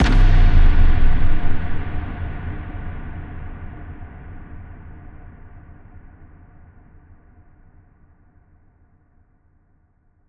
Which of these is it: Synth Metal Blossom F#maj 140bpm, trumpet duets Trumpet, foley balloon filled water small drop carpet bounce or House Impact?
House Impact